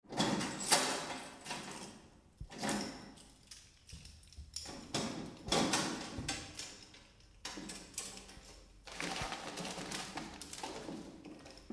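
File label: mailbox, collecting the mail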